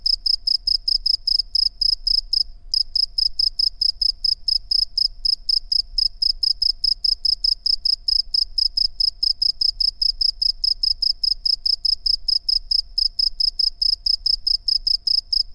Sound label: Close cricket